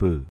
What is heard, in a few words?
pwm